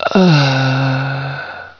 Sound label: uhh